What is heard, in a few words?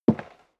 step leather shoes wood creak single right; schritte